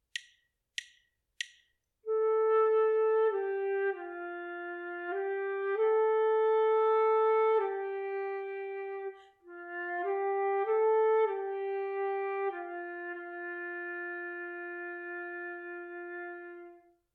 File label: melodic dictation